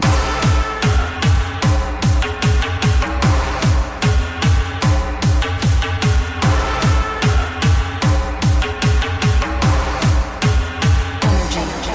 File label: russian hardbass